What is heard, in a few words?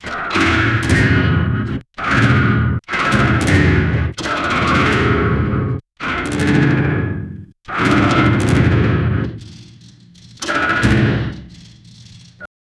mechanical quadruped robo